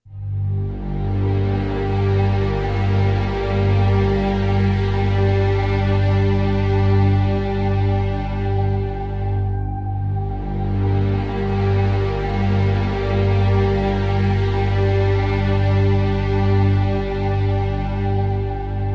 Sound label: Underscore